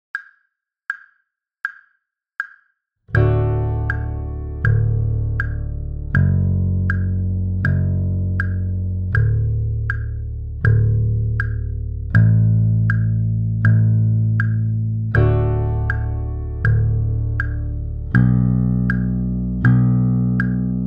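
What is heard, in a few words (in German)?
D Dur Pentatonik Übung, bass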